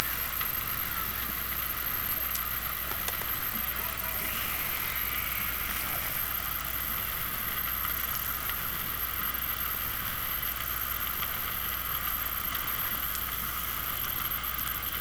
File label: Hydrophones, orcasound